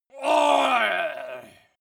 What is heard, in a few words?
male groan painful